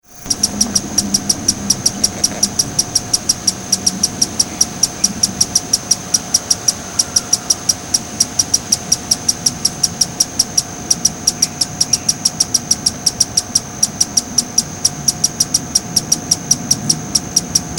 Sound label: Thalurania glaucopis; Itap da Serra SP; Brazil; Silveira